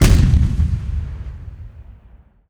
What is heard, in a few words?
Artillery Heavy Shoot; 1944 Battle Of The Bulge